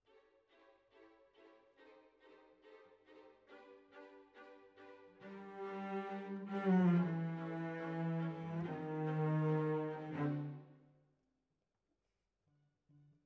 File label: Cellos